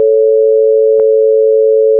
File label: Major third comparison